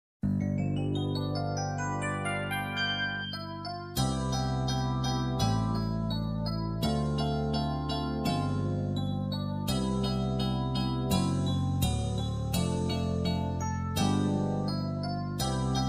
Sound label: Instrumental